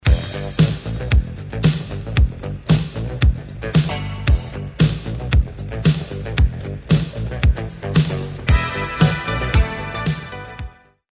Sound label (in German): wartemusik